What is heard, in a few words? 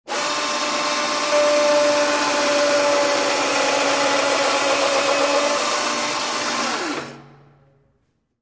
Wood Shaper Power Mot, air tool